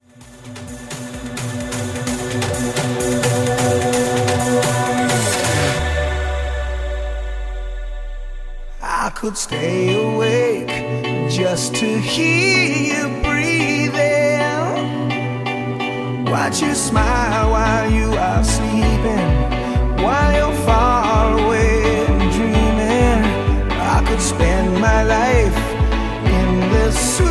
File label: EDM Mashup 129bpm